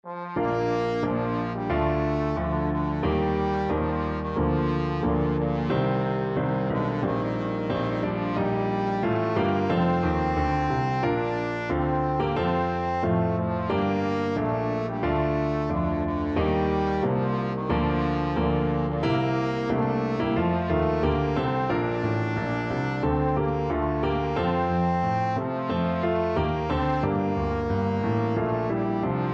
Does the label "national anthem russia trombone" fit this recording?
Yes